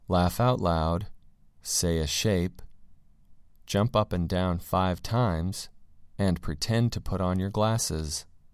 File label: laugh out loud say a